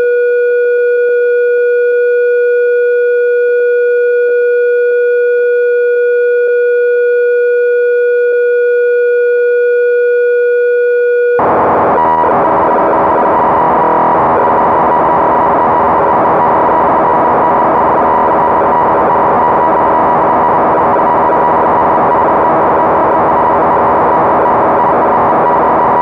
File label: Crumar Bit One factory presets, Cassette Interface; Synthesizer Factory Patches